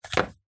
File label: industrial chemical powder in small plastic tub place down table